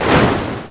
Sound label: ROLL, Prangs